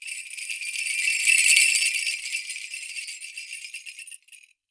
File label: MIXED PERC; 90 s Sample CDs